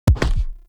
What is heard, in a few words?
Blind Bow Shot Punch; Powers; Fey; Saboteur